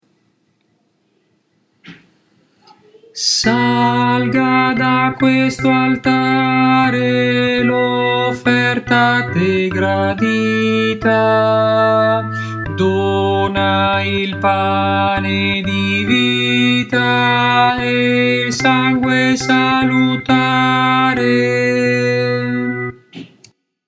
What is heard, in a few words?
tenor